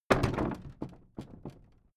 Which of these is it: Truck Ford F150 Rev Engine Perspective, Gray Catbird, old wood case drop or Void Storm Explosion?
old wood case drop